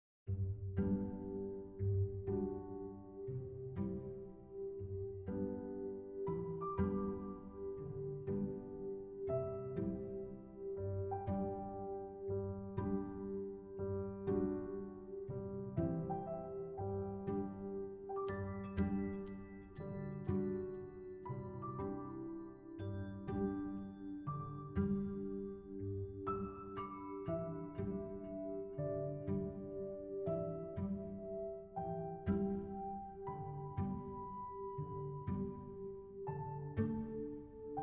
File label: under dialogue